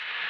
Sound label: slide; UrbanChaos